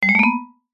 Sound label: cartoon vibraphone short ascend; zapsplat